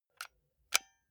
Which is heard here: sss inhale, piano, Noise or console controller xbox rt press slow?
console controller xbox rt press slow